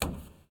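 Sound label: Foot Metal Roof Bare Walk; Lucius III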